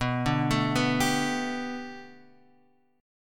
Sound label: B Minor 7th Sharp 5th B Guitar Half Step Down, chord